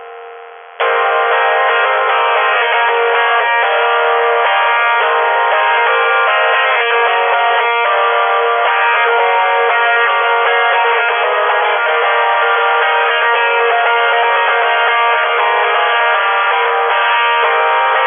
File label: long distance distortion